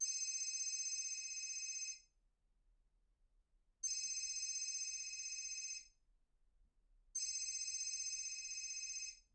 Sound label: phone; IEEE AASP